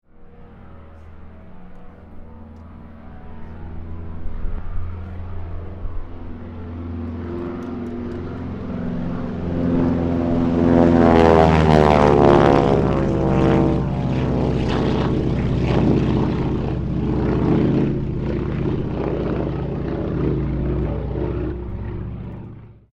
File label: AIRCRAFT AT-6D HARVARD MK III TAKEOFF STEREO; Harvard Training Aricraft; Airplanes